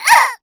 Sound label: Hit Voice